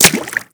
bullet water; weapons; Modern Warfare

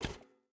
footstep barefoot metal walk; Rust

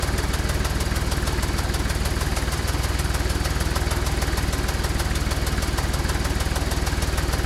loop exterior engine loop, engines tanks; War Thunder

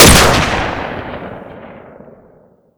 wa2000; weapons; ModernWarfare

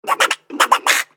Ducking Consumable Hydroacoustic Search; World Of Warships

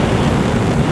fire pipe burst loop; Explosions

